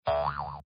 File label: boing soft; English Prep Package; DizzyBee